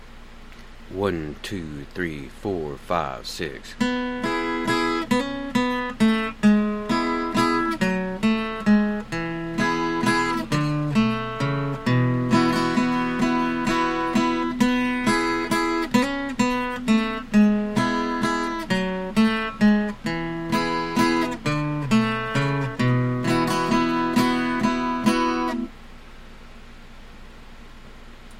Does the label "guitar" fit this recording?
Yes